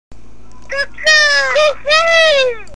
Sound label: Babys Talk